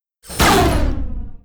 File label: war attack; HOBOROBBER